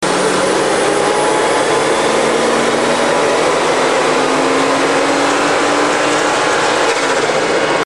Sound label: detonate